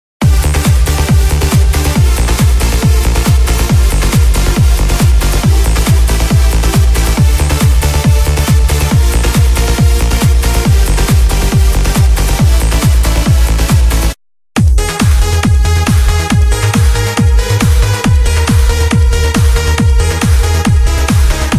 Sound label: TRANCE; CLASSIC TRANCE ANTHEMS 2 SONGSTARTERS